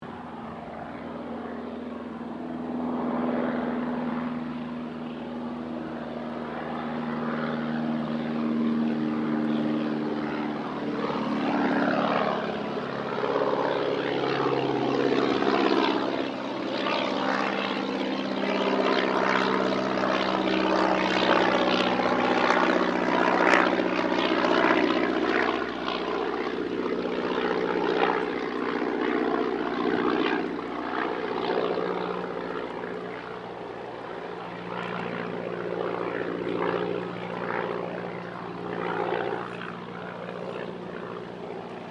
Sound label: AIRCRAFT DH82A DEHAVILAND TIGERMOTH PASS; 1936 Tigermoth; Airplane Sound Effects; Vehicles